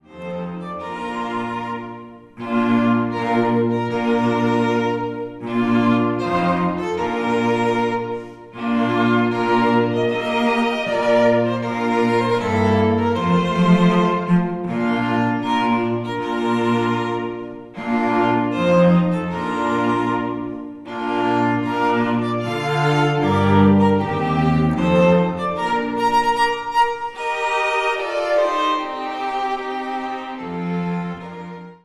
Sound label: String Quartet; Wagner Bridal Chorus